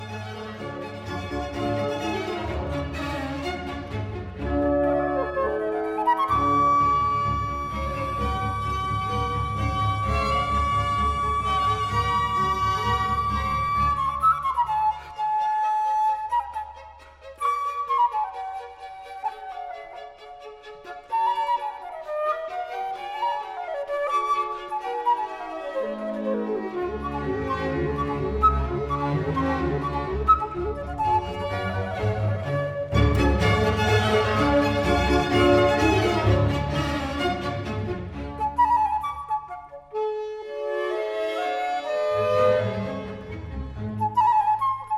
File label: flute concerto in D major allegro aperto